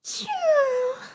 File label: snoring; daisy; sm64coopdx; char-select-extra-chars